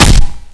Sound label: hit body, crowbar